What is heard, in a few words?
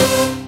Synths Root C